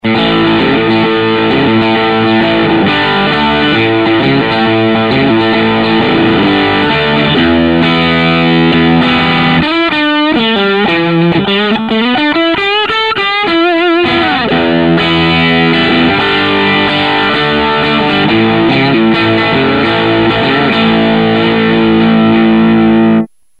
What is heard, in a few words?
Blues; Roger Linn Design